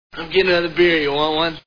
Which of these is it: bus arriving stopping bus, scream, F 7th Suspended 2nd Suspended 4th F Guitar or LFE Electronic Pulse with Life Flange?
scream